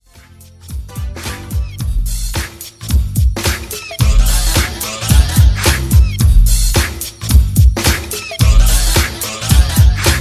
80s Redrum; Remixfreakz

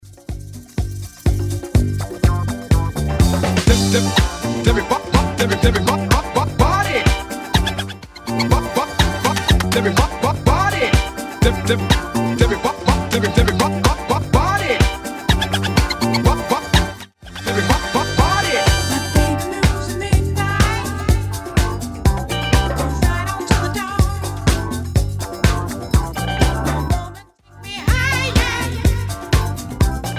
70s Scratch Redrum 124bpm CLEAN

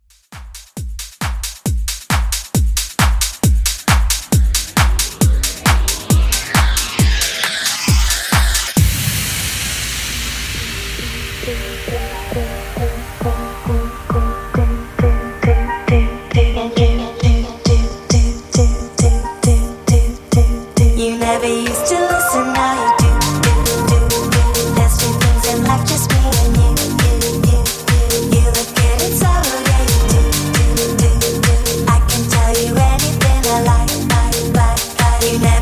club house mix 135 Bpm clean

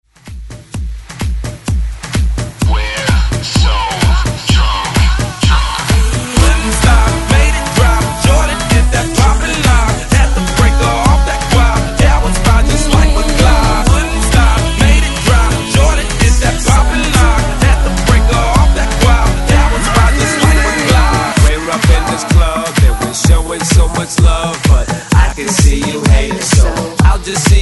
Hype ReDrum 128Bpm; remixplanet

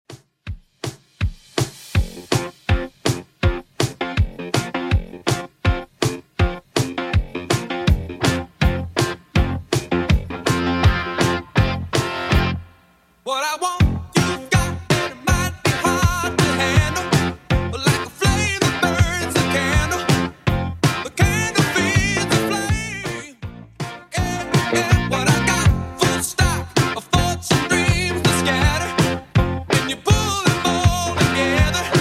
80s Redrum, 162bpm

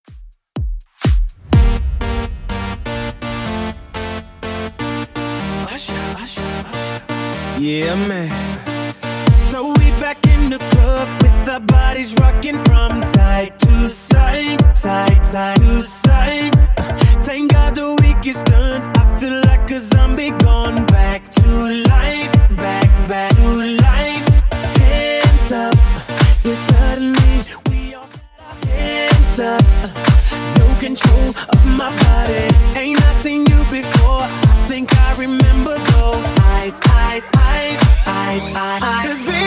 2ks Redrum 124 bpm

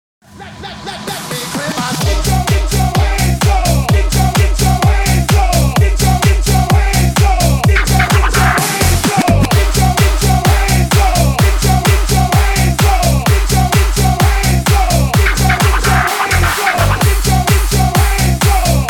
BOUNCE HYPE 128 BPM

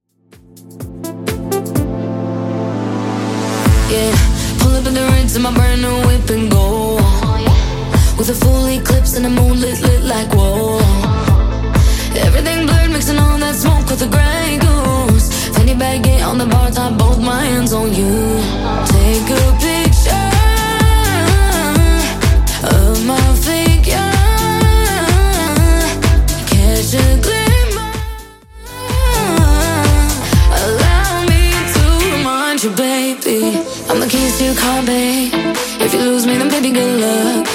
Top40 Redrum 126bpm CLEAN

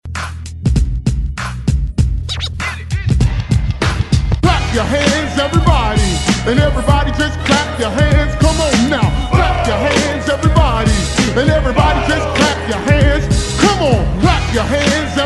70s Hype ReDrum 101Bpm; DJDOLLS

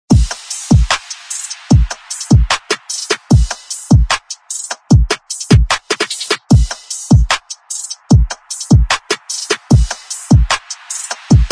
Transition

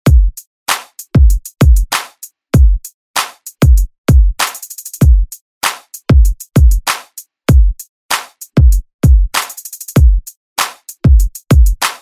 Hit Party Hype Redrum; Deejay Hit

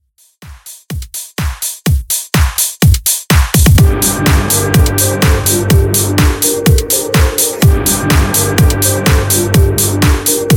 Club Re Drum 125Bpm; remixplanet

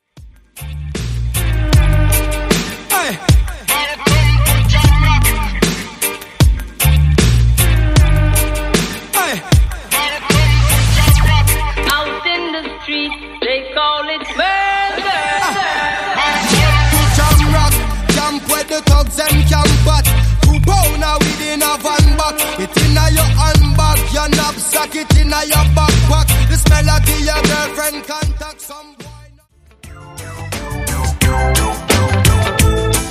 REGGAE

